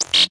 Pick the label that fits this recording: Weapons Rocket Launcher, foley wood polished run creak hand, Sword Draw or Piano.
Weapons Rocket Launcher